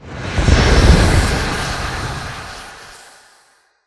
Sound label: hornet poison cloud gas